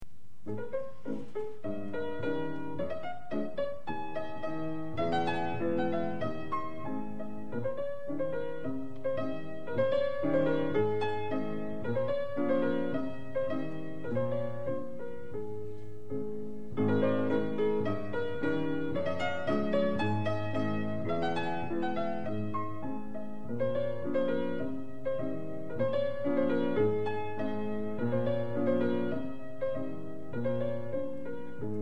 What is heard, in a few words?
folk dance A flat